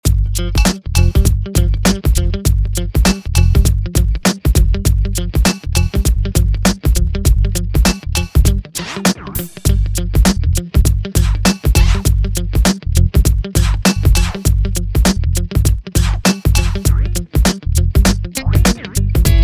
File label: funk; urban